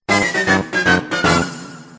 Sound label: miss music